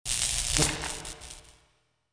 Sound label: unpowered; zones